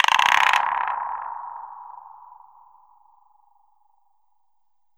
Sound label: gun toys; Ragga & Dub Reggae; AKAI; USB Soundscan vol.27